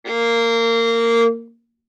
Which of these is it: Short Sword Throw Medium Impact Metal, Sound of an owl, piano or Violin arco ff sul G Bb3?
Violin arco ff sul G Bb3